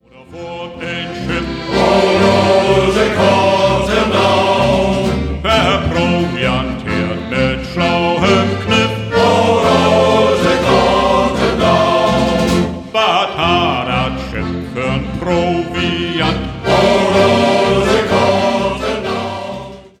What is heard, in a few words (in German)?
Shanty Chor